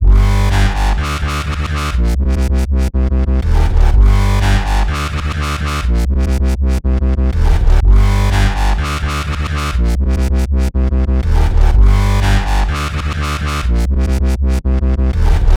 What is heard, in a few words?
Full Mix, Complex Electro Synths; Impulse; Prime Loops